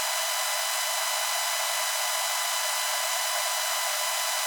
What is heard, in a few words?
USB interference